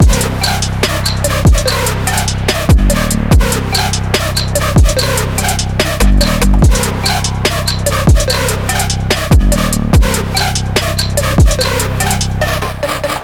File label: brutal sports beats loop